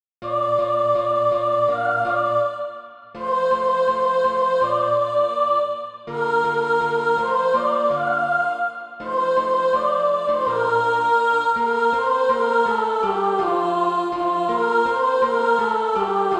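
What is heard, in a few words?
sop, Practise Music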